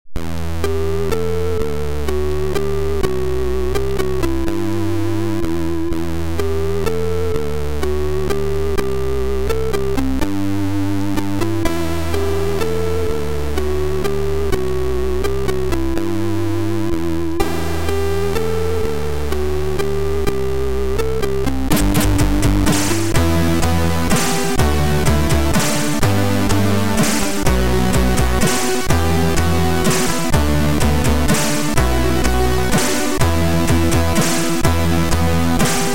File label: Forest music; Metal Warrior Trilogy Soundtrack 2004; Metal Warrior 3